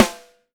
6X12 ALUMNUM, Living Drums

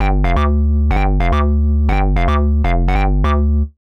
125 Hard Synth Bass; PowerFX - Nu Jazz House

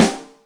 RIM Brass, Yamaha DTXpress Acoustic Snare

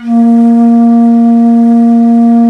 T. BONSURI, India Winds

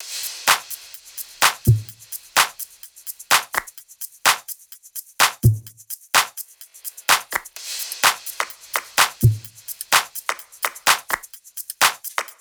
loop top 127; Seismic Loops

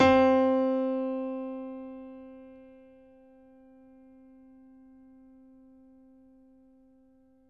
piano; East West; The Ultimate Piano Collection

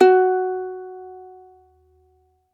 uke F#3

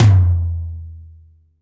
F Stand Tom, Fusion Kit; Akai MPC500

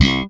Bass, pull C2; Sampleheads; Will Lee Bass Library

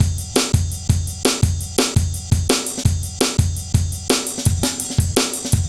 heavy live ride, drum loops

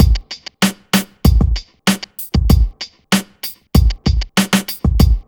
beat, Hip Hop R n B; USB Soundscan vol.19

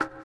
PERC; Spectrasonics; Metamorphosis; 3RD MAN

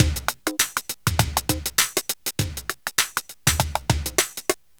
Smooth, R&B Drums

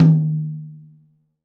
HTom, Akai MPC-500; Q1 Kit

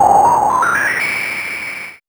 POLYMOD; AMG - Vince Clarke Lucky Bastard

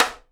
SIDE STICK, Live Snares

